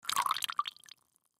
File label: Coffee Creamer; Coffee Creamer Pour Cup; Pouring Sound Effects; Foley